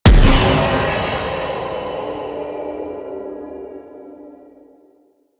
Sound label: Harm End Burst; Warframe; Animus; Anima Animus